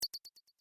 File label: Beep Electronic Ping Multi Step; User interface feedback sounds; Beeps (Sound Effects:Multimedia)